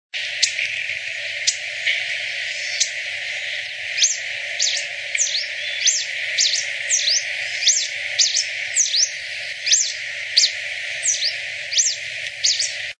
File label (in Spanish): mont cabeza negra